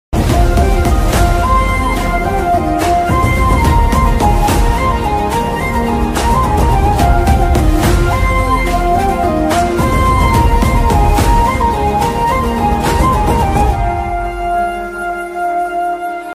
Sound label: song background music ringtone